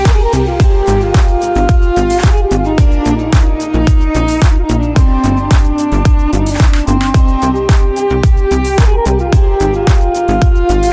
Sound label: English Music Ringtone